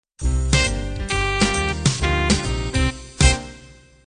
Cool Jazz End

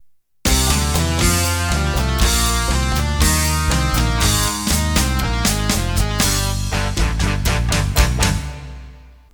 Open Rock Intro

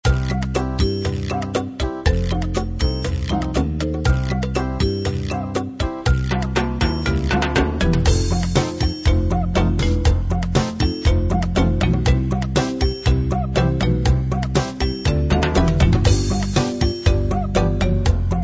afro pop

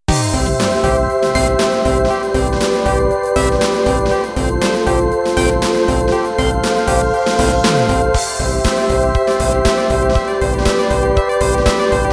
Dream intro

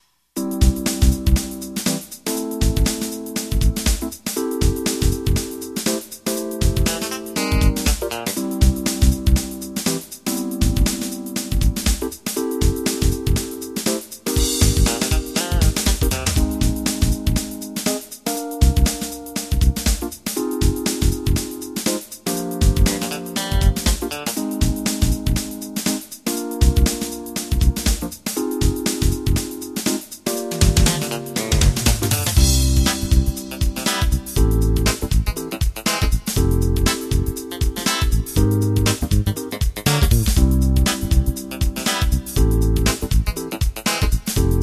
Groove

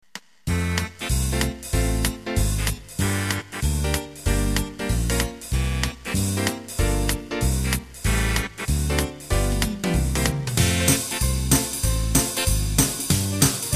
Ballroom Swing; Acoustic Piano, Acoustic Guitar and Sax Section; Perfect for many swing jazz moderate to up-tempo standards

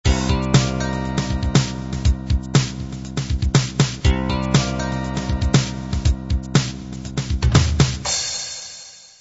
fatback groove intro

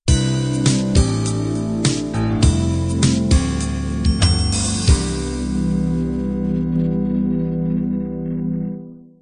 color beat end

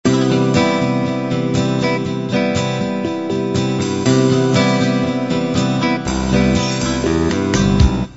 light rock intro

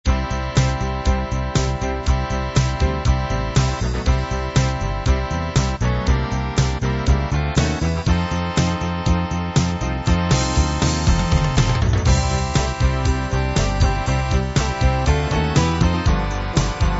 hard rock

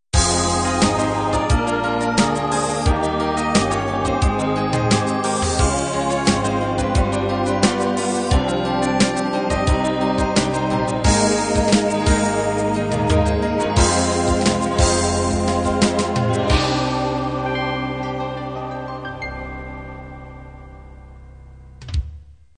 cinema ballad end

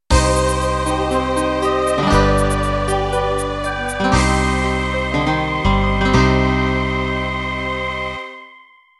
Schlager End

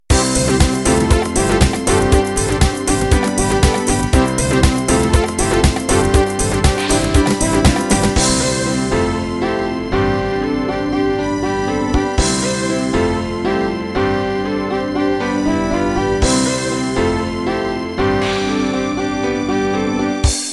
House End

